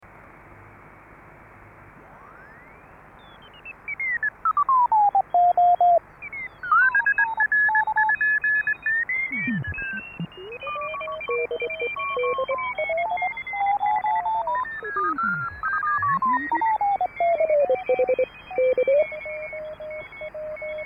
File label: tune 40m; DC RX Polyakov